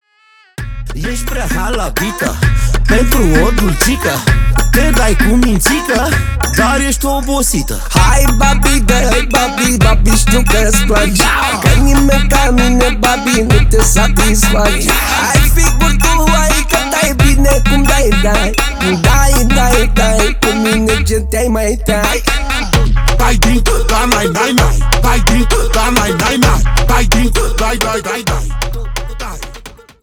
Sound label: sax